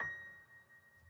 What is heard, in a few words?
piano sus